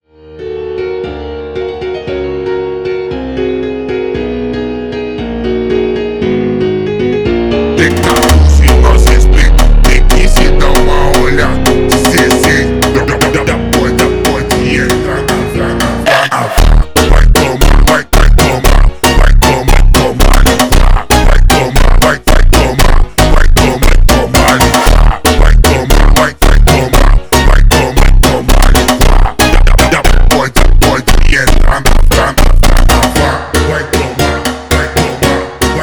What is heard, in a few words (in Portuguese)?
slowed